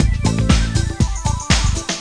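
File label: jingle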